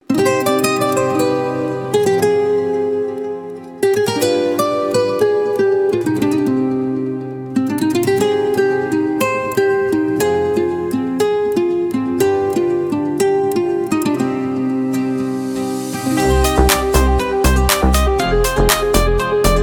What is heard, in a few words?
flamenco